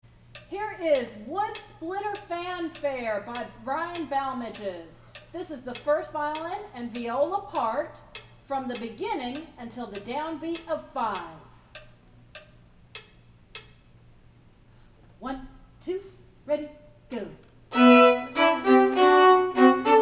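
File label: Wood Splitter 1st viola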